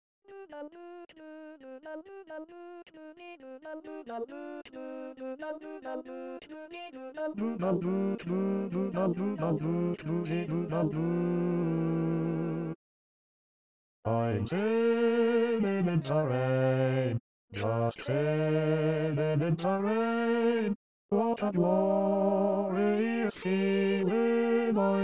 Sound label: Bass SATB Eb